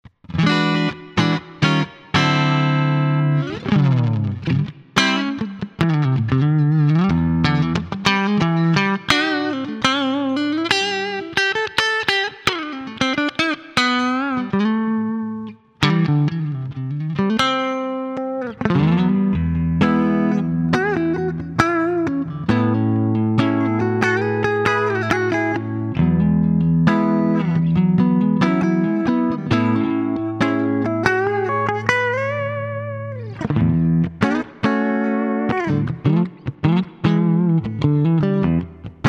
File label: Ibanez QX54QM Pos. 3 Clean